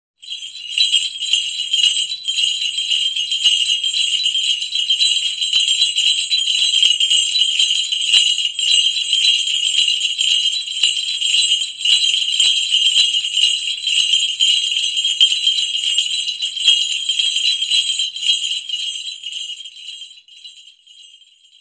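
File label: janissaries; janczary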